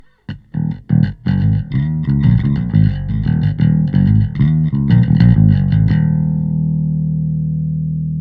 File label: EQ BASS; Pensado